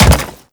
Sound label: Step Default; Warframe; Grineer Leto